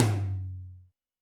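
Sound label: Tom Ambient Md, Alesis D4 drum machine; 300 Drum Machines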